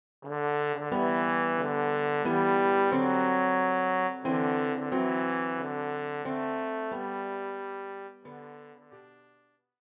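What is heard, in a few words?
trombone; für Posaune und Klavier, Schwierigkeitsgrad Klavier Level 3, leicht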